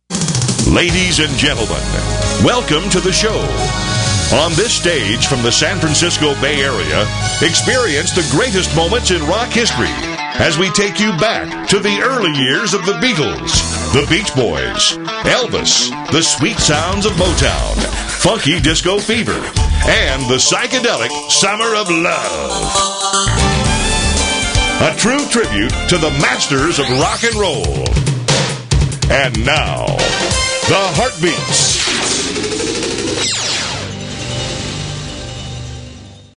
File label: Heartbeat Intro